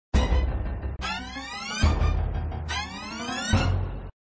Music Lock animation music (Multiball sound effect), flipper